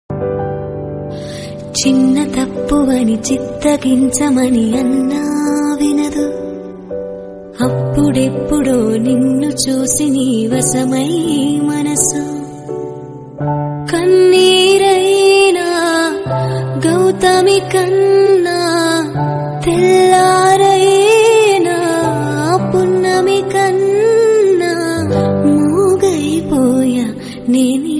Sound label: godavari song